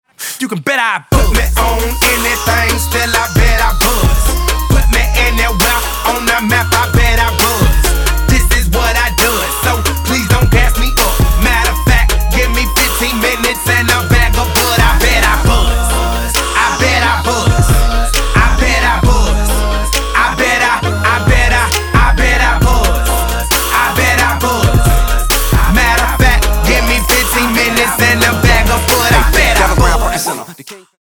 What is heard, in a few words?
chord dictations minor; harmony